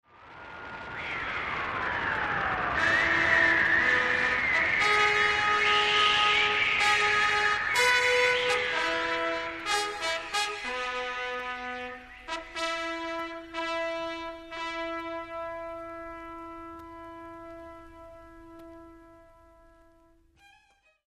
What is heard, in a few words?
Fanfare